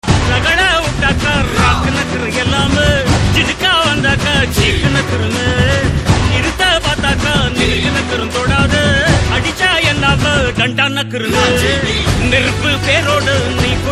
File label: Tamil Ringtone; Darbar; Rajini